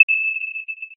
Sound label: ui hover, sfx; prism